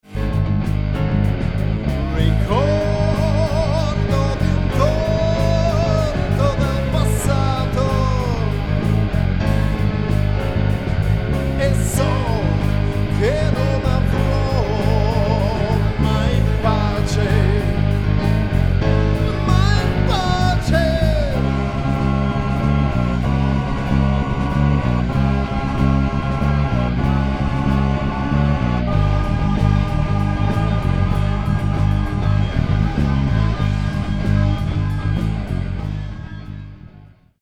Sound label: song